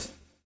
SWNG

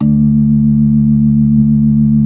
B3 D 3; Best Service; Hyper Dance

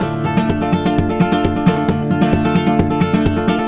130 D Rumba; Spanish And Gypsy Traditions; Soundscan vol.59; AKAI

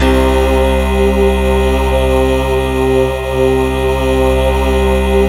STACKOID, KURZWEIL K2000

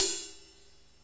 RIDE, CYMBALS; Back In Time Records - Big Bang

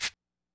Cabasa

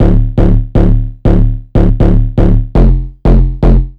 Bassline; Basslines; Drums & Drones

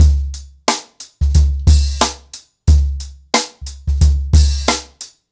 Ripple Effect 90BPM, acoustic drums; The Roots Drumloops

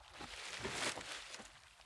Trudge Step, outdoor, Footsteps

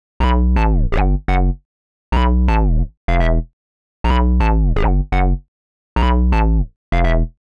Hard Synth Bass E, 125; PowerFX - Nu Jazz House

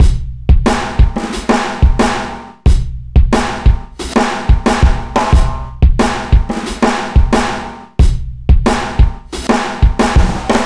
090bpm, Downbeat Dance Grooves

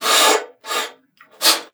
PUSTE, BASSOON; Xsample VOL-6

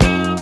HIT, Hits & Brass

